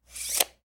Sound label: tape measure